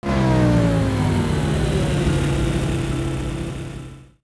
limited speed off, lambo

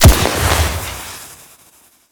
thermite impact; gmod; viper

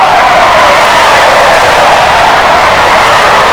low, tires; vehicles

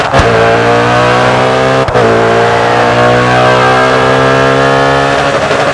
third, vehicles; kuruma

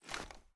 ar ads down; weapons; gmod; viper; scharlie

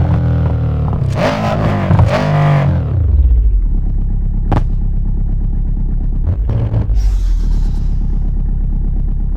v8 throttle off slow loop; vehicles; gmod

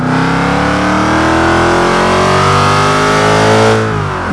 second, vehicles; gmod; tdmcars; landrover

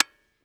fire disconnector; gmod; viper

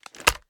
reload fast empty stock end; xmag; gmod; pi mike

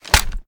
raise first end; gmod

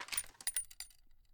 ar idle active move, weapons; gmod; viper

